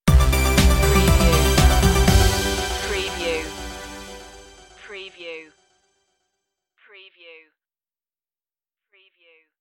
hokkaido stinger

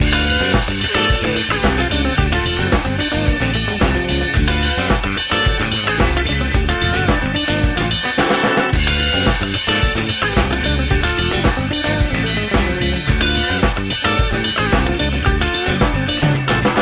funk spice groove loop

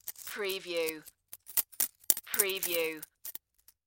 Coins Moving in Hand

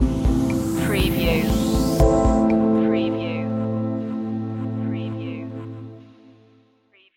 calm shores stinger

